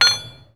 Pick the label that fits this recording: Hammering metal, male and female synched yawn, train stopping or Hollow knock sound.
Hammering metal